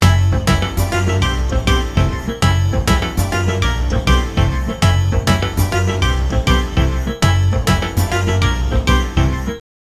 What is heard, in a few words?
background music for; mario